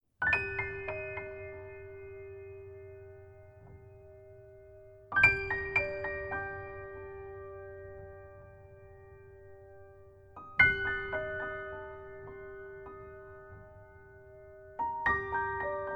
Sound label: piano